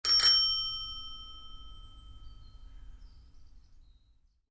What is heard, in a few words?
Bicycle Bell, Single Ring; Bicycles; Vehicles